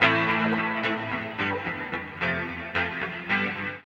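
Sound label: guitar, right; East West - Phat & Phunky CD2; 90's Sample CDs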